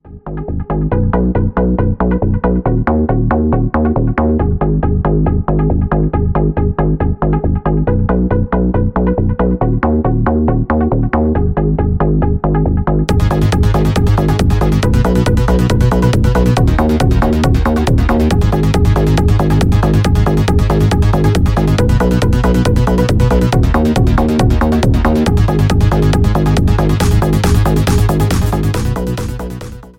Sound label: Electronic; MIUI12